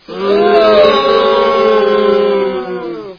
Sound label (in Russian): tolpa vozmushenie